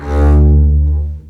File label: marcato, String; East West; The Ultimate String Collection